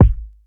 Royality free kick drum single shot tuned to the D# note.; D sharp key